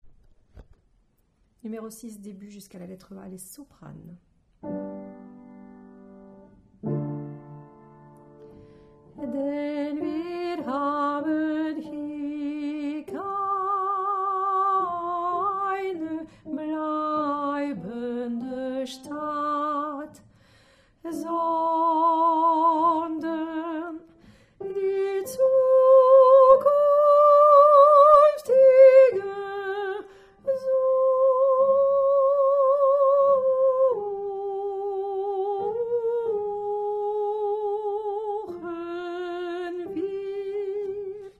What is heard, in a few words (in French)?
Soprano